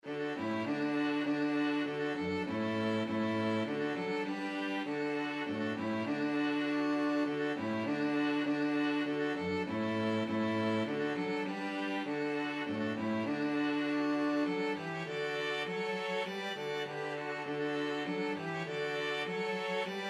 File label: string quartet; come thou fount